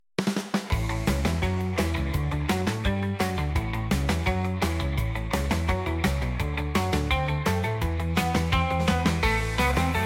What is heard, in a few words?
rock, surf rock with organ and claps; Suno